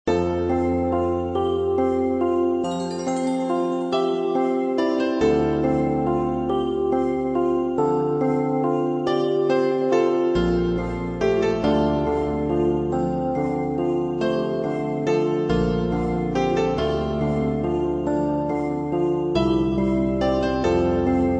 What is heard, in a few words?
Wintry Town (Loop); Darkend